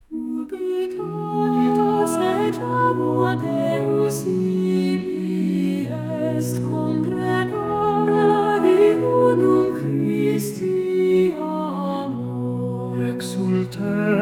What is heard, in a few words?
Ubi Caritas Female, gregorian chants